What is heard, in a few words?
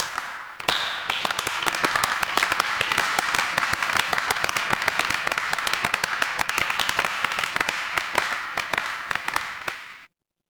crowd applause